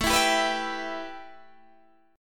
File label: A 7th Suspended 2nd A Guitar Open D, chord